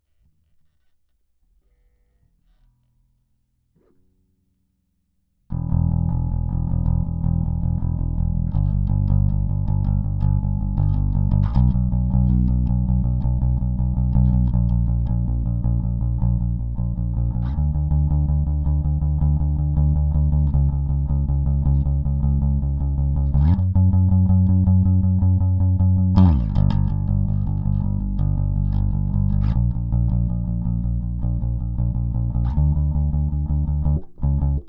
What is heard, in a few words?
Felt